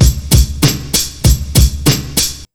97 BEAT, Pure Electro; USB Soundscan vol.03; AKAI

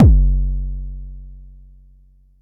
Bassdrum, Jomox Xbase09

